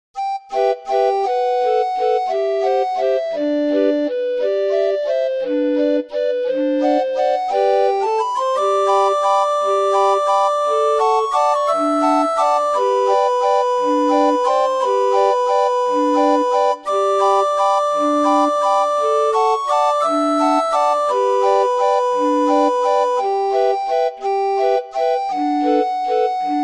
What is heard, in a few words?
Miserere verdi, orgue barbarie